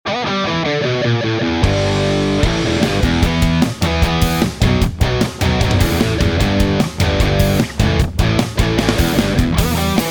Power Pop Punk, Celestion Vintage30

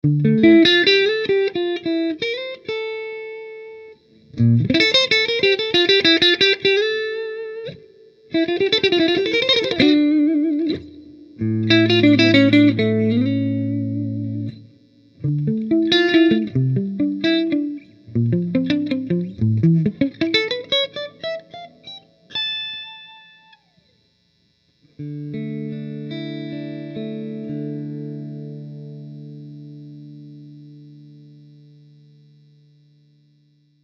Fuchs Clean Machine

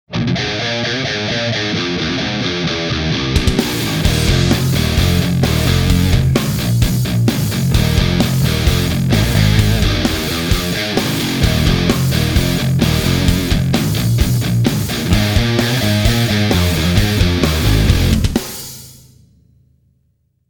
Low Down Celestion Vintage30

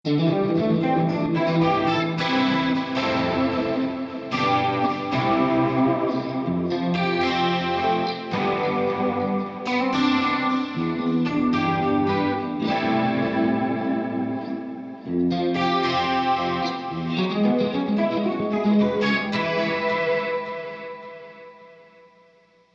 System Comp Pitch Delay Reverb Lead

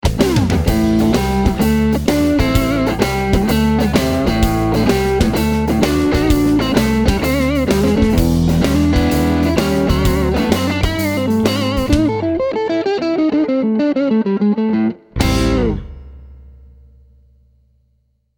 Texas Blues Celestion G10 Gold

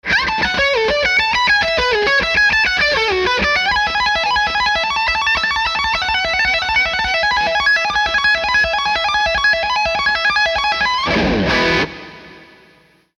Barber Electronics Small Fry; S470; Burn Unit

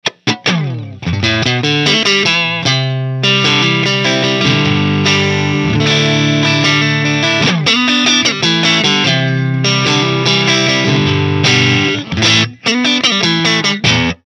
Amp

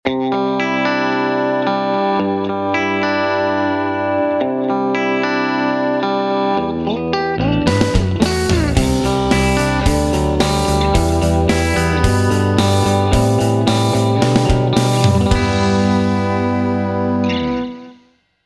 Irish Chime Jensen P12Q

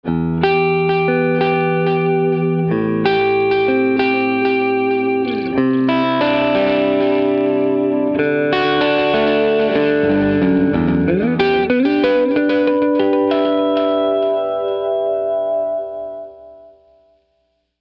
Landgraff OD Distortion Pedal OD2 No Diodes

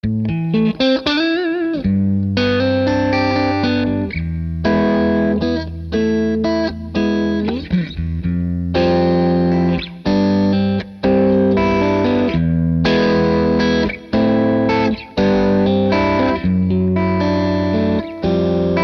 Small Fry Burn Unit Strat; Barber Electronics

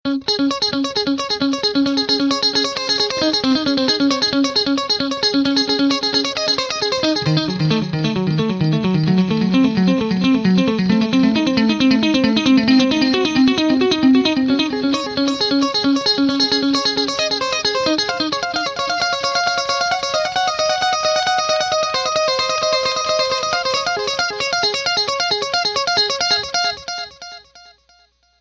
TC Electronic 2290 Ibz Challenger Arp Delay

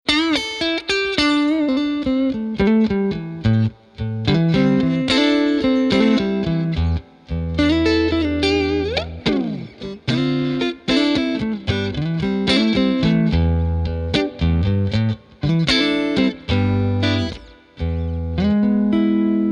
Fender Pro Reverb; Sennheiser XSW72

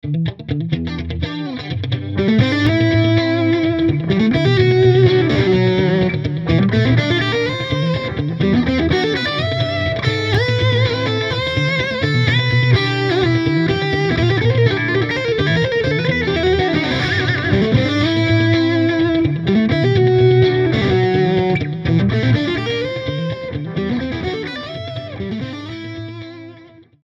Cornford MK 50H II

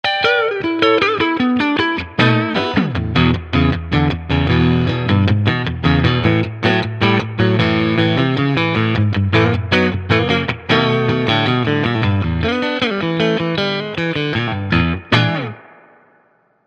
ESP LTD Elite ST-1 Fender Deluxe Reverb

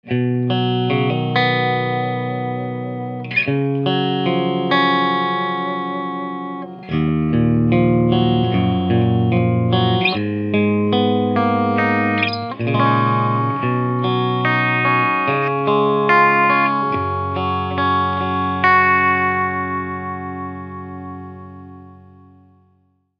Fryette Pittbull 100CL SG Clean

